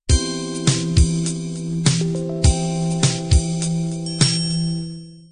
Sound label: color beat intro